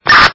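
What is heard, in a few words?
push-to-talk, radio effect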